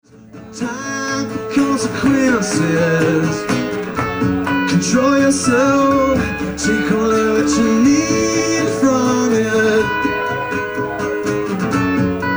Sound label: Kids duo